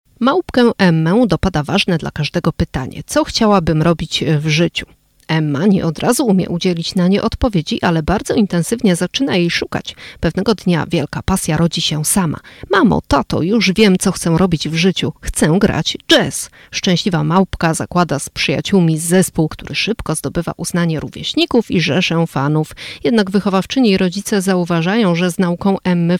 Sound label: jazz